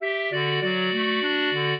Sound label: clarinet; Mozart